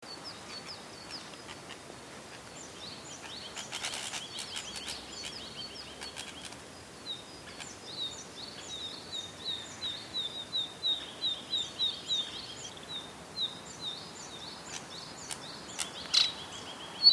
kibita

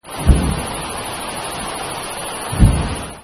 hanabi

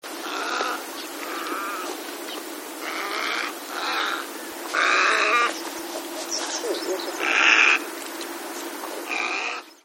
kosagi

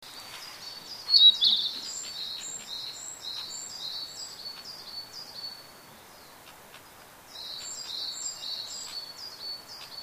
kibita

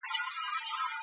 iwatsuba

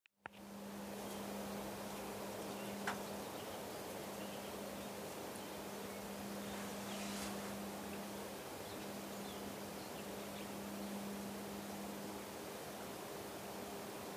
miyamaho

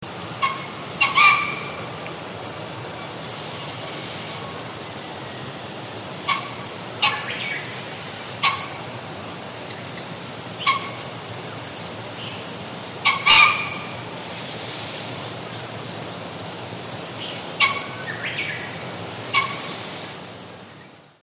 kiji

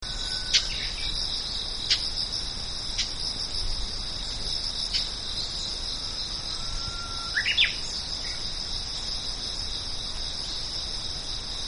uguis

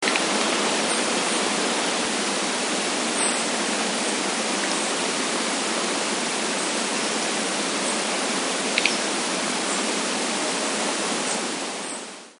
mugimaki

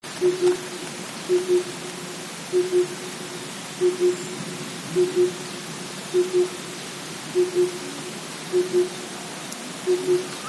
tsutsu

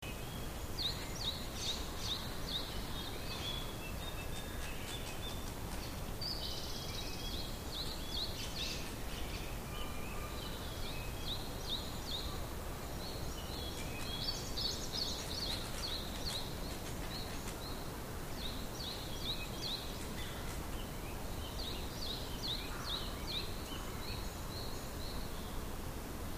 kibita

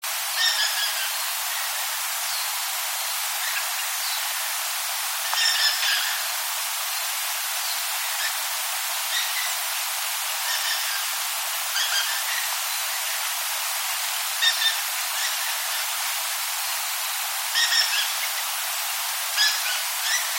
tsugumi

